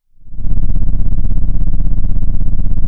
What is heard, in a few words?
Sound of an engine revving